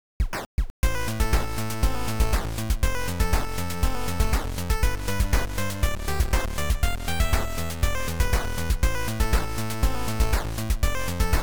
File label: chip